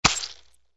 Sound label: slice only